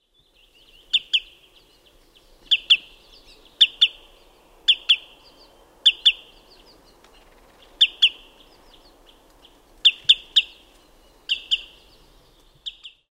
wood sandpiper

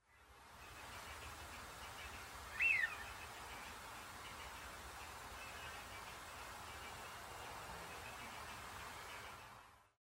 wigeon nocmig

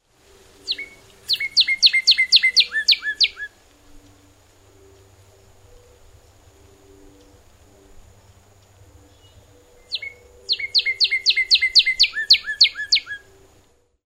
northern cardinal

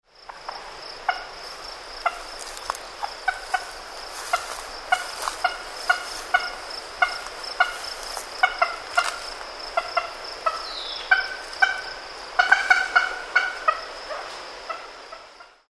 wild turkey

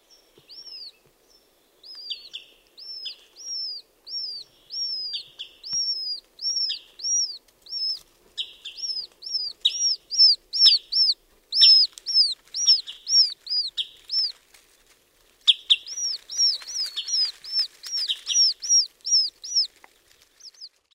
wood sandpiper

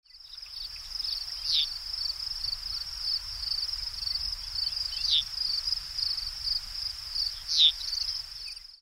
eastern meadowlark

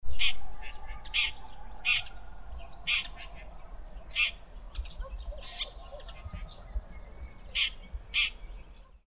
red backed shrike, bialowieza

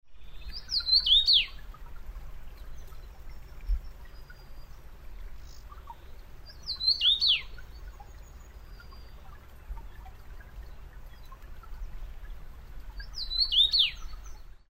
common rosefinch; teremiski

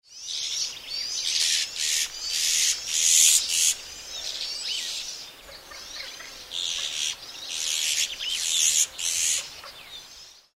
blue rock thrush

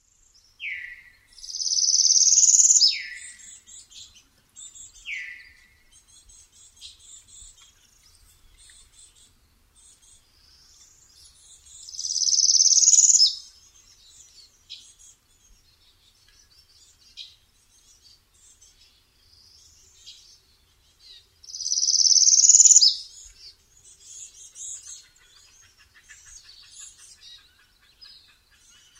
northern parula

